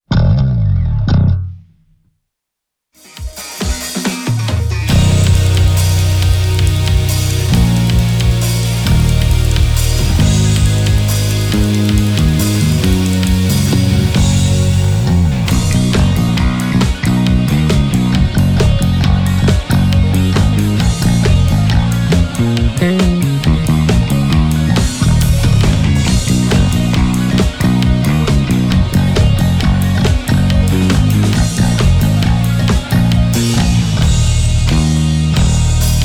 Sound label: Finger Alusonic Custom Shop J Special Deluxe 5